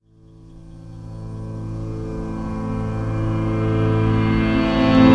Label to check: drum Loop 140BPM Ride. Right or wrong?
Wrong